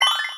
Toy Message

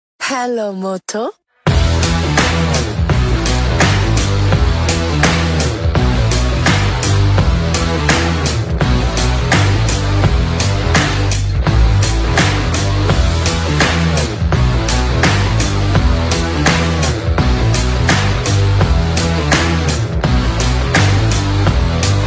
Moto G6 G6 Plus

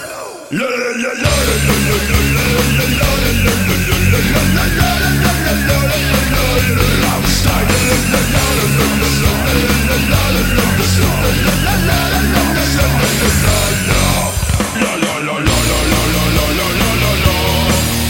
Lalala

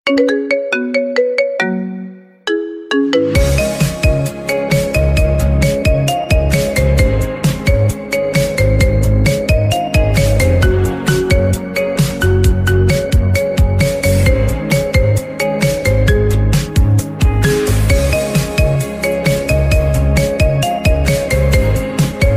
Marimba

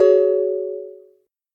Samsung J2 Prime Whatsapp

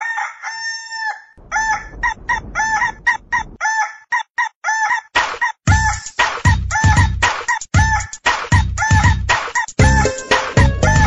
Chicken DJ

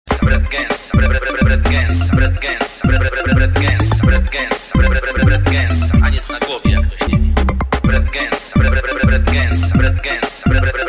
Dbass Remix

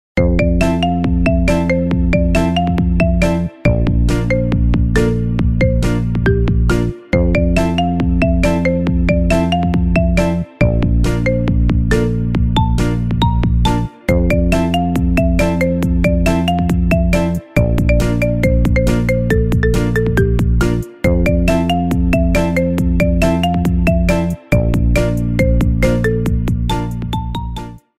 Marimba

